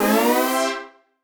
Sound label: C, Poly Chord Hits, Ramp Up; future rave